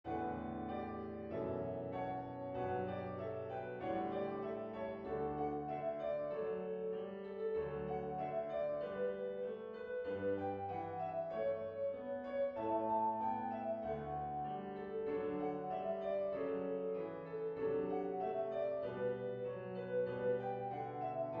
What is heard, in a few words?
jingle bells